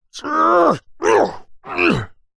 human male fight vocalisation karate; zapsplat

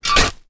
industrial shovel metal dig dirt soil; zapsplat

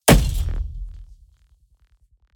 Impacts designed; soundbits

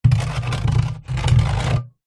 foley container water plastic empty drag ground

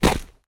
footstep dry forest floor; foley

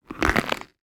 foley rubber object movement; sound effects one

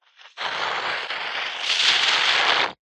foley liquid frothy squelch suck long

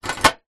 household portable camping stove hot plate lift

